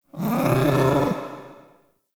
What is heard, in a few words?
Ambient Meow; Characters; Warframe; Kalymos